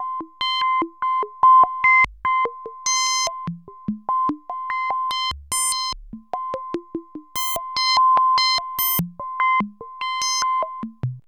RHYTHM FM

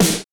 DANCE SET, DRUMS

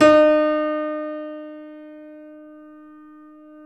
C7 F, The Ultimate Piano Collection; East West

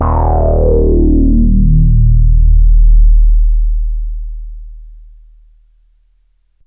FUNKY, BASSES; Masterbits - Vintage Voltage; VV BASSES; FUNKY PAVE

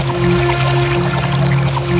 WET, WATER; AKAI S6000 CD-ROM - Volume 1

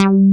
PRO1

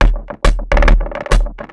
percussion loops, Techno; PS-45

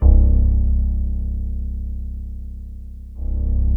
C1, SynthLine; 90 s Sample CDs; NiceBeats; SynthLine VOL-33

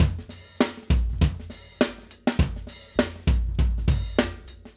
Acoustic Live Drum; Platinum Audiolab

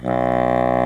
WOODWINDS

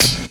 SD, Drum & Bass; Ueberschall; Drum & Bass Resonance

